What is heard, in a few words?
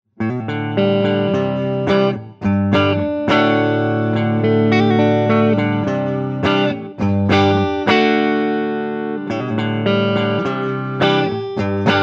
Powerplus neck single coil